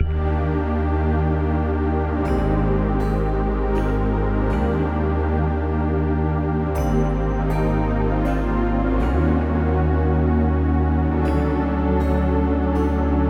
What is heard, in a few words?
menu; crosscode